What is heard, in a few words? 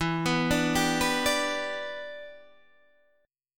E Minor 7th, down, Guitar, DADGAD; Em7; arpeggiated